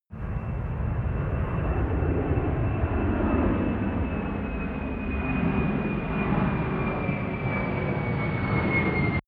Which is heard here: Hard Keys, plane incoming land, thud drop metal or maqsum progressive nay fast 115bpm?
plane incoming land